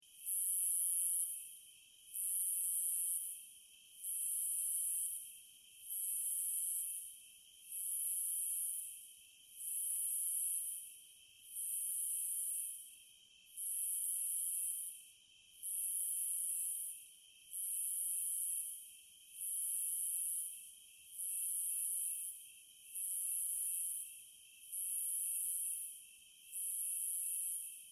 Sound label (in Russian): Zvuki prirody Cikady